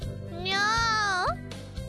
nyoooo

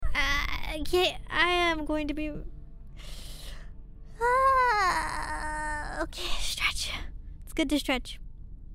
stretching

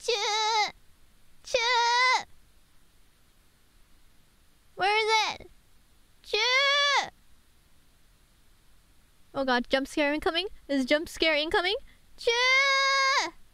CHUUUUUU

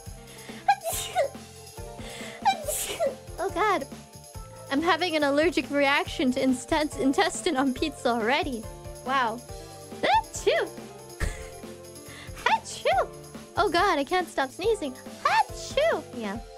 Sneeze

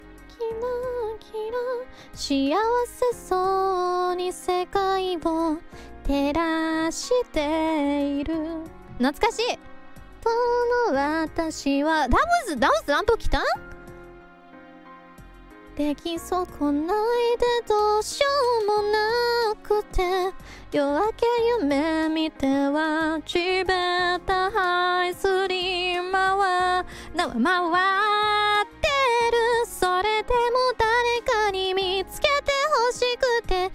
Singing; IRySinging

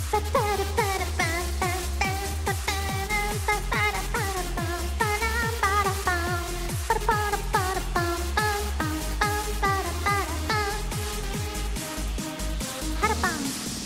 instru

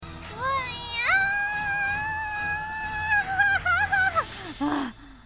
yaaaaaah

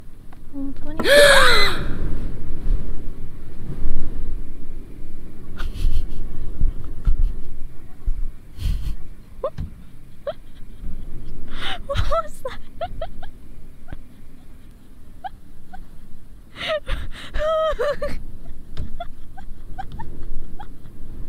Scream and cute laugh; IRyScream

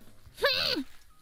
angry nephilim be like

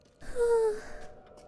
cute sigh

